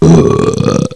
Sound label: belch; Nare Gewoontes